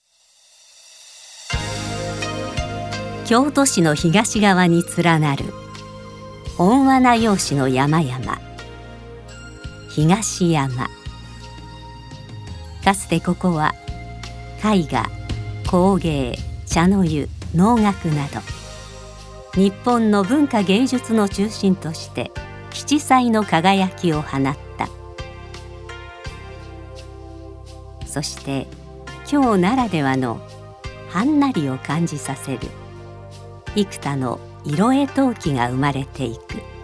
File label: bgm